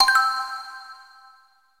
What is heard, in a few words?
pickup chest; diver jimmy